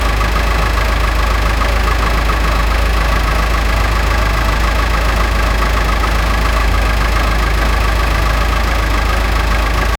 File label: start engine of a truck